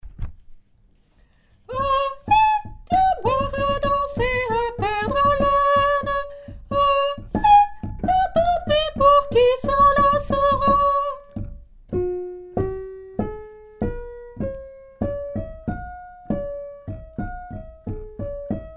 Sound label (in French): chanson villageoise tenor, chorale